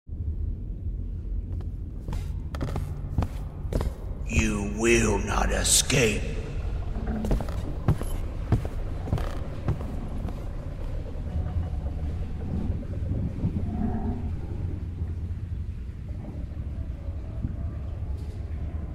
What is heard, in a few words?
ambiance; MAD SAILOR